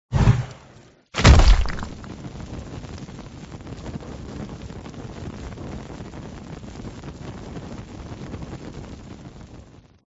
buff fire; Thunder Fighter

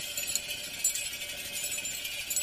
reel spin; wanted spin; Sheriff Of Nottingham

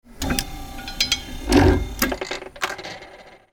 ball remove, roulette; Roulette Royal

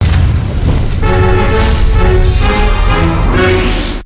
free spin init; games; Dynamite 7

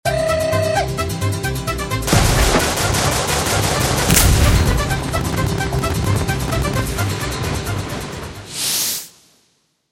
bigwin super

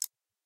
bigwin counter; Great Book Of Magic Deluxe; Wazdan

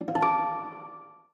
line win; Sheriff Of Nottingham

